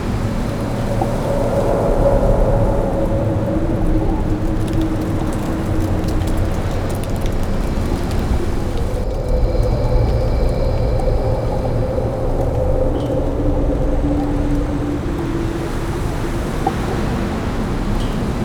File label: Night Loop; Ambience; Mendicant Area